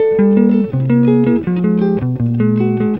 EGUIT ARPEGG; Best Service; World Colours

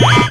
SHORT, SHORTNOIS

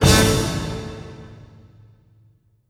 HIT, orch hits; Orchestral Loops

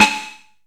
Shock Snares, Percussion; Dance Synths

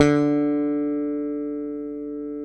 SPEC SUSTAIN, Bass

